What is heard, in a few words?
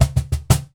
Cajon Fill; percussion; musicradar